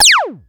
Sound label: Shoot, Retro Games Sound FX